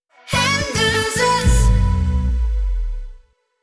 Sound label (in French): Chant Theme Logo